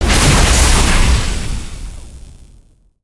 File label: Spinjitsu Lightning End Blast